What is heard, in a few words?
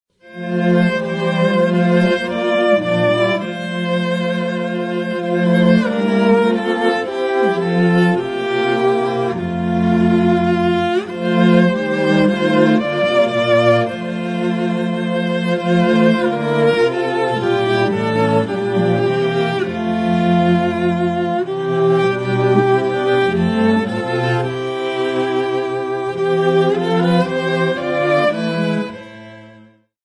Now Thank We All Our God (cello quartet); Sigfried Karg-Elert